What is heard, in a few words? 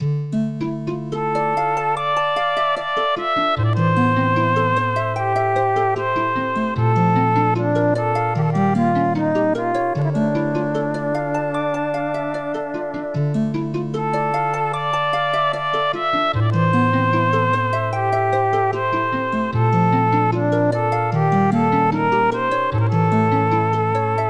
Flute; Dual Orb 2; SNES; Cassius' Flute